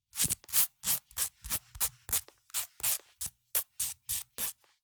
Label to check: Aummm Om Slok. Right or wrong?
Wrong